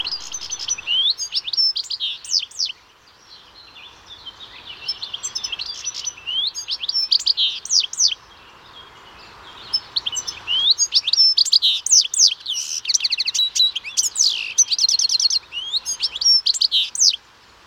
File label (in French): chant chardonneret; Le quartier de la Torse héberge une faune importante et notamment une foultitude d’oiseaux